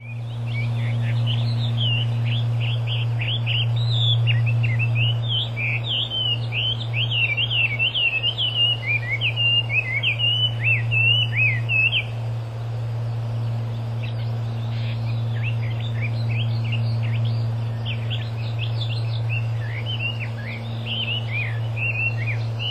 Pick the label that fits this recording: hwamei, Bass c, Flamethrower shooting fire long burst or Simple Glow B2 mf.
hwamei